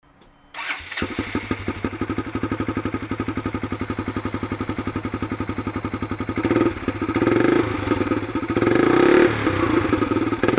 p-ace full system

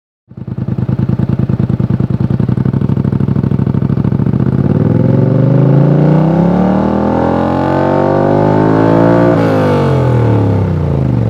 sportsman, exhausts; polaris; pipes

